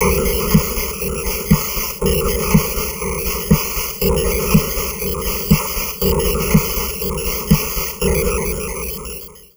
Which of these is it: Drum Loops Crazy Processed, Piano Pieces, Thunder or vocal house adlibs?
Drum Loops Crazy Processed